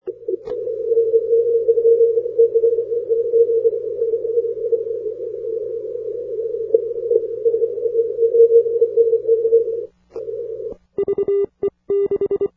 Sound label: 40m cw, A watery QSO with Egypt. Direct path at my evening.; SU9NC; FT-1000d